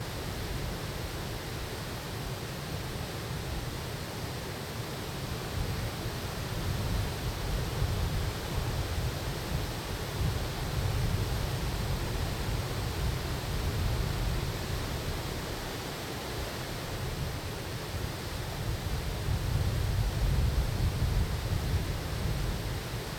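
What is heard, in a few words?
Scottish herd exterior; the 39 steps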